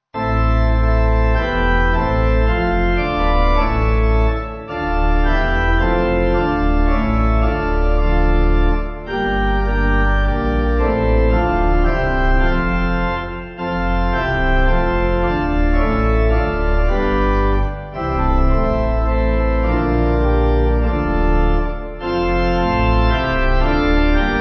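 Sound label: Erstanden Pipe, Pipe Organ; Christ The Lord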